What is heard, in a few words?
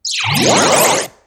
whoosh